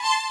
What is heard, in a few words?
violin